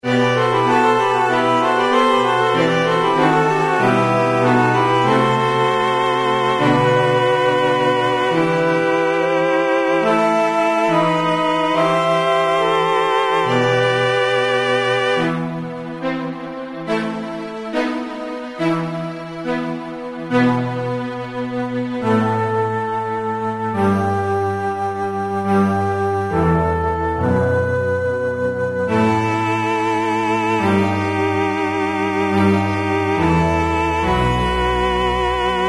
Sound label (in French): concerto pour flute orchestre cordes